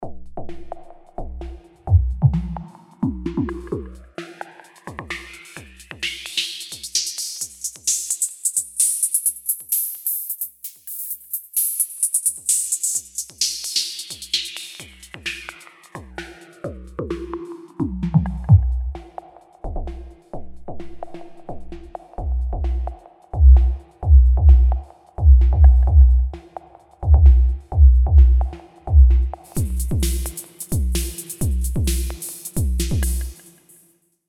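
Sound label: drum kit stereo filter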